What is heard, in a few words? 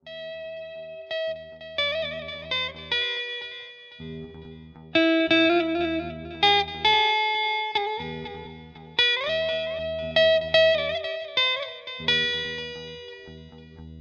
VAGULABHARANAM RAGA